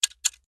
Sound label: equip timer; frontline